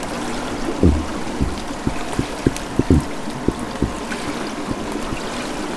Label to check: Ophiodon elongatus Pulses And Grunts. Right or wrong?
Right